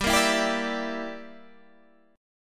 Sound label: G Minor 6th, Guitar Standard